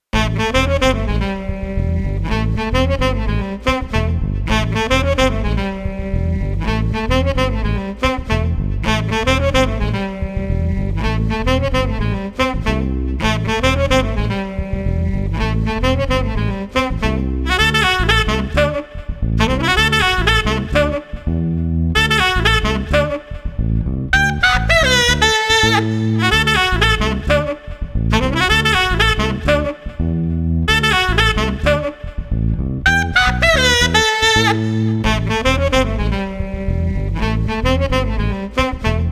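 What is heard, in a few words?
Street Sax Bass Combo Funky no Drums, 110 bpm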